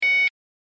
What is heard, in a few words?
mustang alert; fordmustang